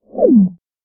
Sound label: Level Down